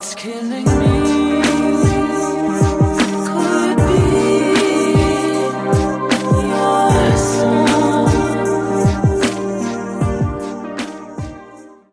interdimensional harmony

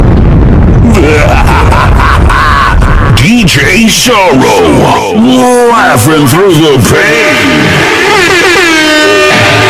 laughing through the pain

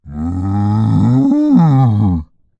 cow sound

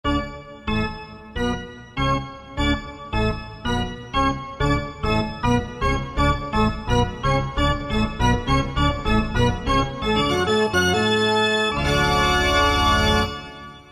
hockey arena organ song

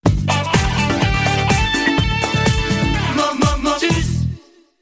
jingle; motus

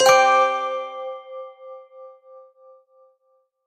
correct ans

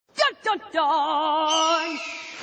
dun dun duuuuuun; rainbow dash

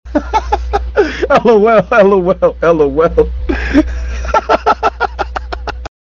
lol lol lol

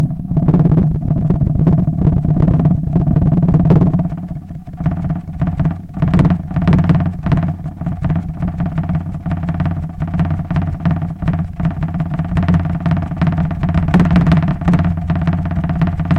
loud rumble

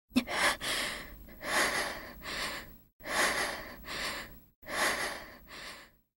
heavy breathing; kasumi

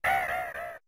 hit; krow

